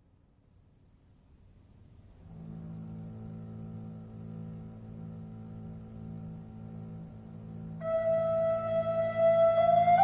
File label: Lullaby; Irish